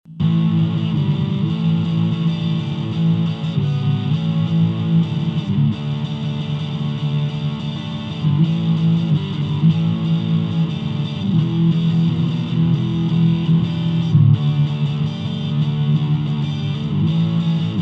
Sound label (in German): the tbone rb 100 e gitarre distortion